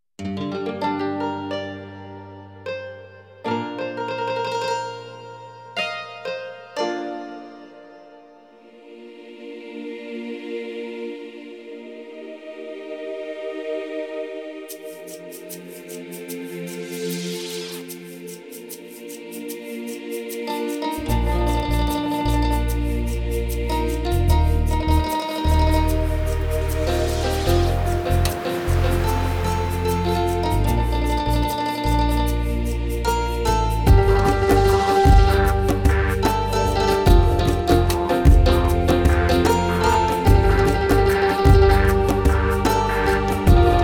Interlude Instrumental

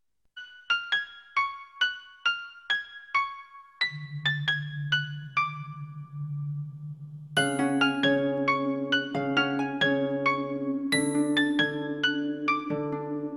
Title Music

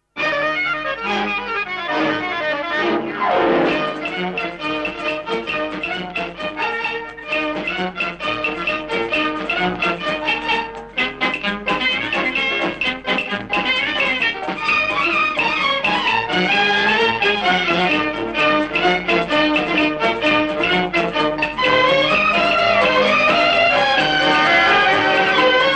Title Music

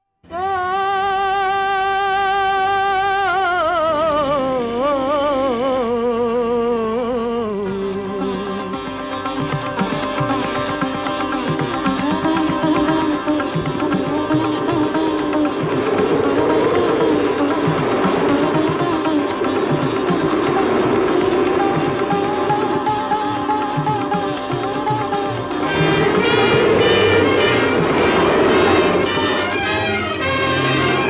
Theme Instrumental; Sohni Mahiwal

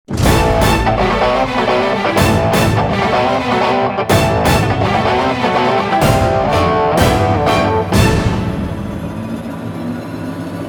Instrumental

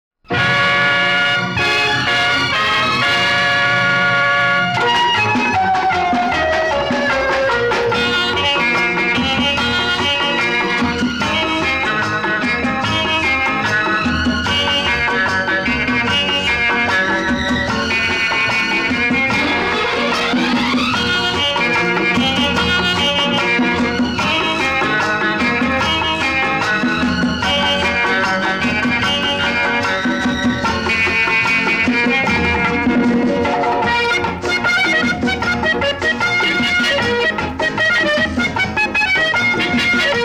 Love Theme Instrumental

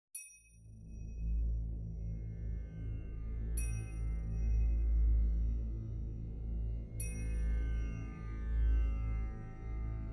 Fade Out Instrumental

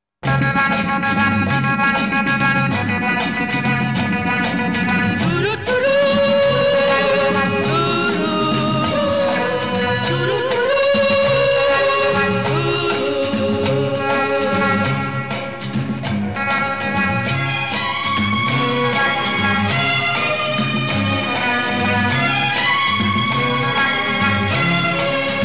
Theme Instrumental; Khel Khel Mein

